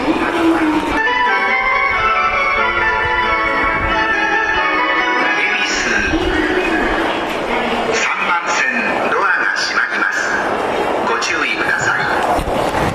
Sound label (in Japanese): 発車4音色a, 恵比寿駅, 埼京線・湘南新宿ライン; 大崎方面